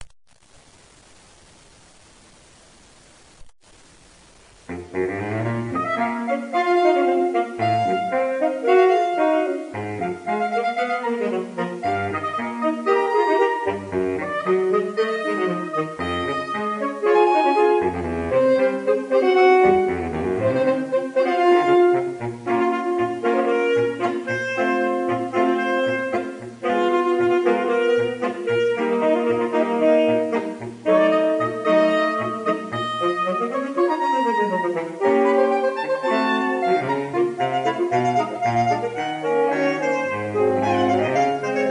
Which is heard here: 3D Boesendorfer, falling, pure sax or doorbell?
pure sax